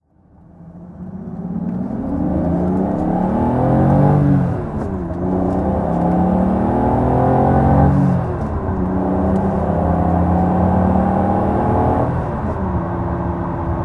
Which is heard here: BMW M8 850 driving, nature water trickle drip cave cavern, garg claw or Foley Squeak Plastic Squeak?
BMW M8 850 driving